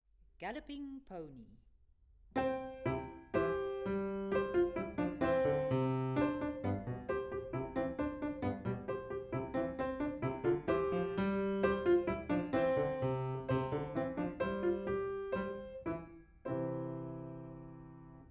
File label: Galloping Pony